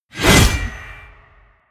sickle hit

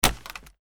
football pads being hit

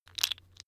tiles wood

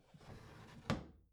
bathroom drawer close soft

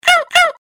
creature quack funny

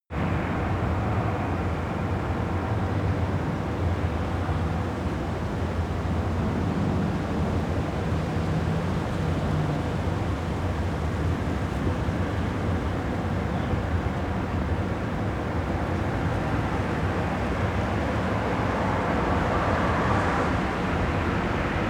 traffic night

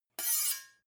two knives large scrape short

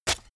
shovel stab

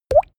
glug

